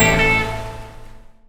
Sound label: Wild Water Scatter